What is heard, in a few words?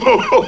fall; tigger